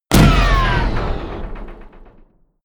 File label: sci-fi plasma cannon explode